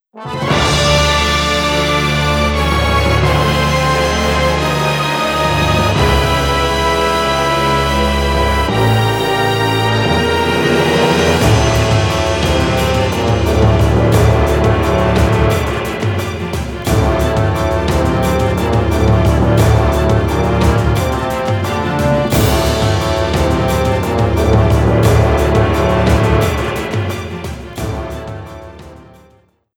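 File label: background music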